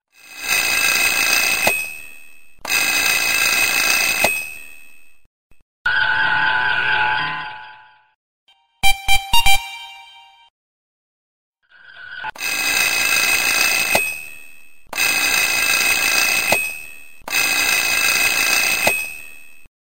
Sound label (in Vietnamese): am thanh bao thuc vui nhon